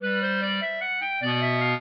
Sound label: clarinet; Mozart